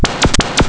power; Amiga Soundtracker Sample Packs

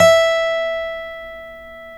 folk steel, guitars; Spectrasonics; Hans Zimmer Guitars VOL-1

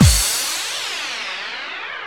BD-FX, Dance Mega Drums; Best Service

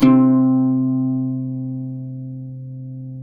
cuatro; Spectrasonics; Hans Zimmer Guitars VOL-2

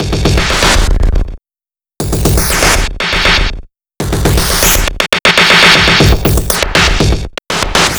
Beat, Modular Electronica

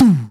SNR; Crypton - DrumBase5000; MPC DRUMS

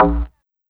ping; Sonic Foundry (Sony Creative Software); Synchro Funk; One Shot Sonics

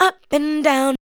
ADLIBS 90; Ueberschall; Jam Box

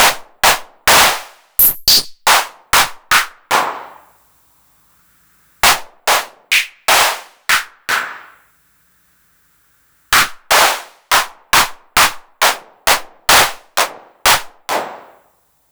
909 mod HC - HAND CLAP

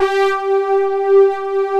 SYNTH SOFTS; Pro-Rec - Synthsonic

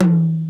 626 HI TOM, TR-626; Hollywood Edge; The Big Bad Beatmachine

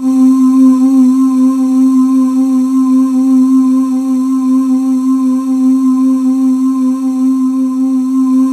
C4, Synth Leads

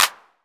perc classic clap

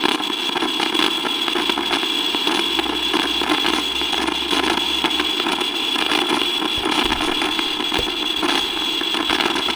EVP; Sonic Foundry (Sony Creative Software); Loop Noir

